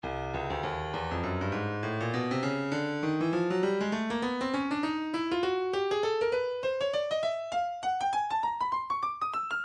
breakbeat midi 100 bpm; slugworth c; Stylus Breakbeat Midi Files

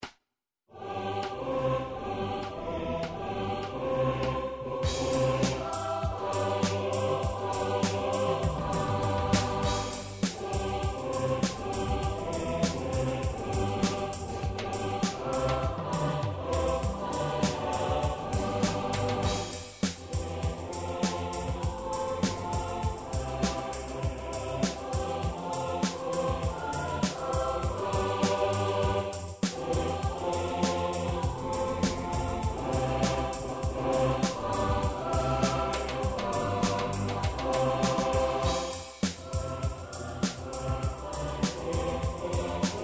advance australia fair

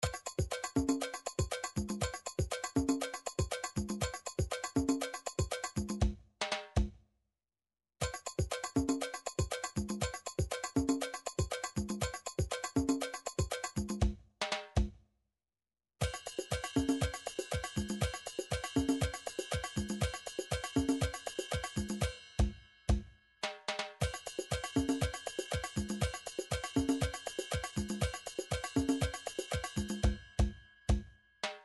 drum mambo

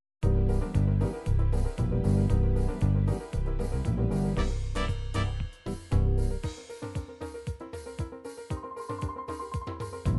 disco tropic

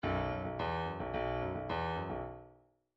bdf midi file grooves funk c funk c

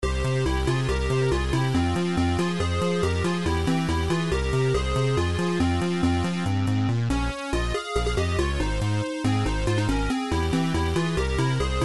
techno remix; gameboy tetris music a

